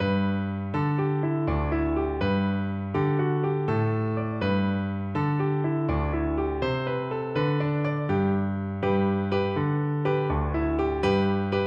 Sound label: violin; swaggering jig